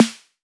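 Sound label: snare drum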